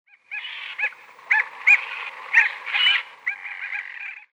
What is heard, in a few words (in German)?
Zuegelseeschwalbe Sterna anaethetus; Vogelstimmen